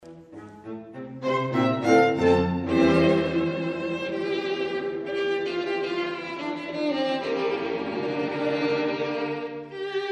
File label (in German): Streichquartett a moll; Beethoven; Op.132